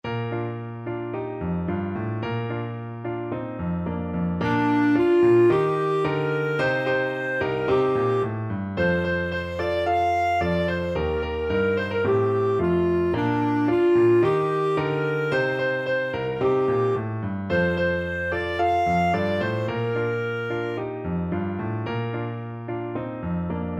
taba naba, clarinet

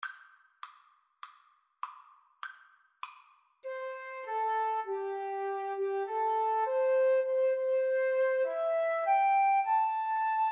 mountain thyme, Violin Flute Duet

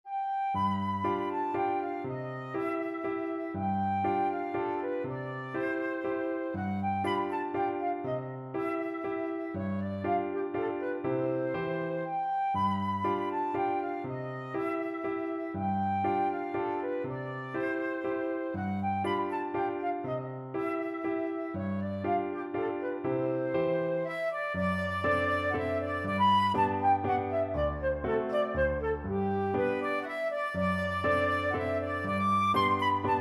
flute

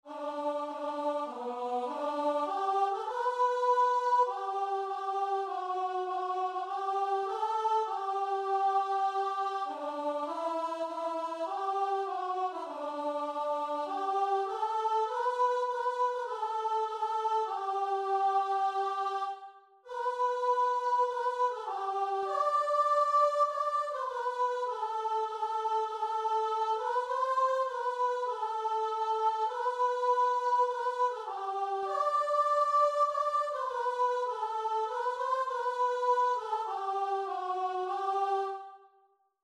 saviors love, keyboard

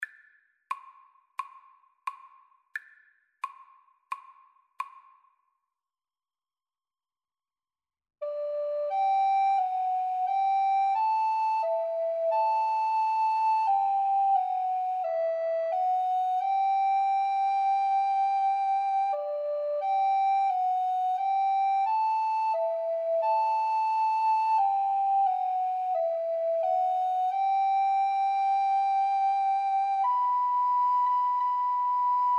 aura lea, Alto Recorder Duet

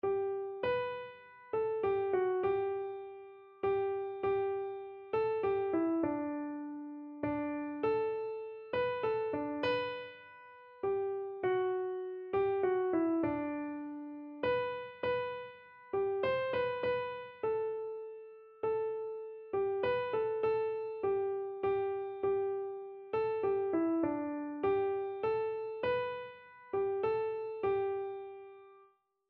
i need thee, keyboard

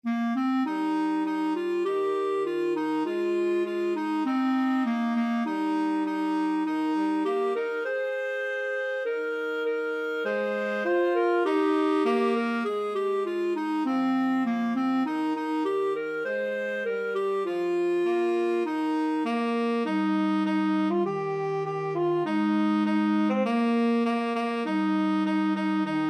clarinet tenor sax, clarinet-tenor saxophone

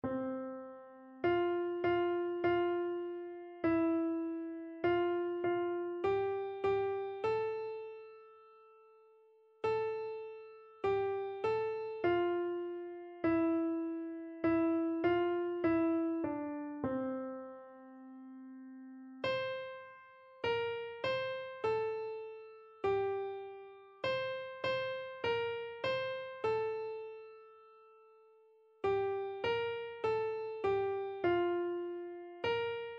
soul of my saviour, keyboard

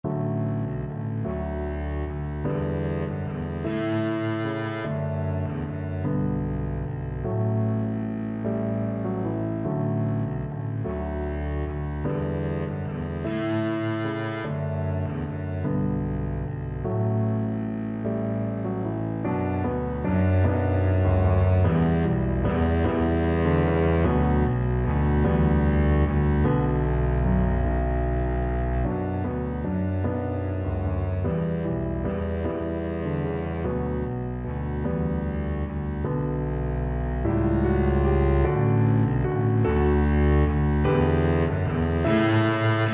skye boat, Double Bass

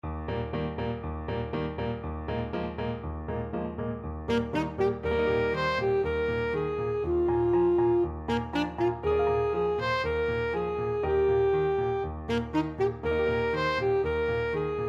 ASAX, saxophone

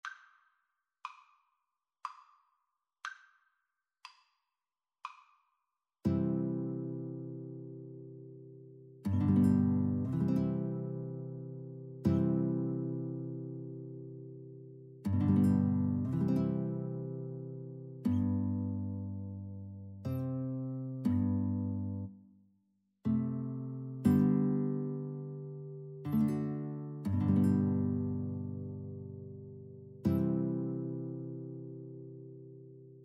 clarinet guitar, Guitar-Clarinet Duet

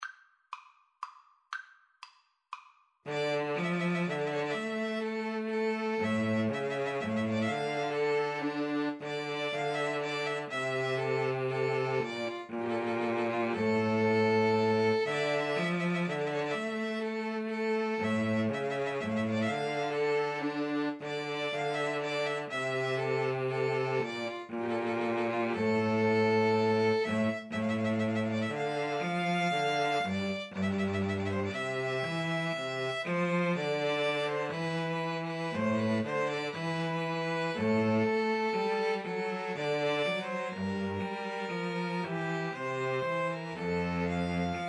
pastorale, 2 Violins Cello

